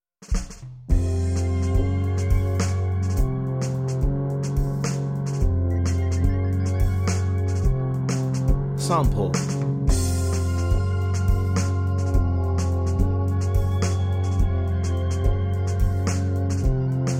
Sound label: slightly spooky; Graveyard